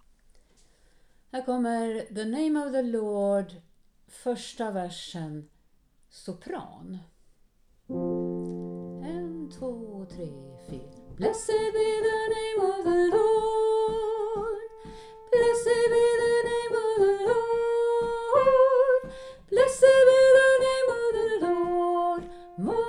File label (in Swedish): the name of the lord sopran; alla kan sjunga koren vi sjunger i höst